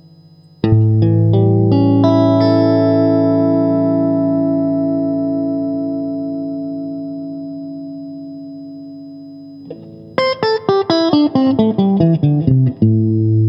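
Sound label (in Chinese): 60S OCC 单晶铜 清音 2档, acoustic guitar; kindman 60s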